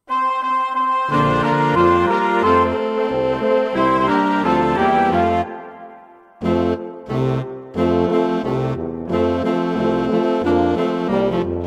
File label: Drums